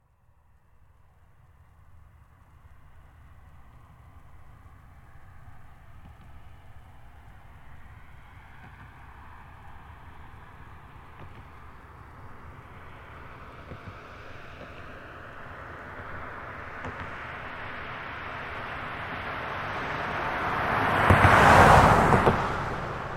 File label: Car Highlander By Med-Fast; 2005 Toyota Highlander V6; Cars; Vehicles